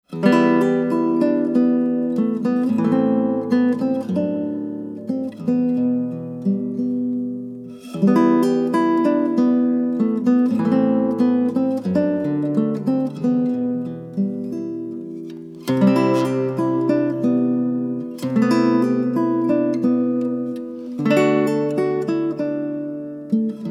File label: made in china guitar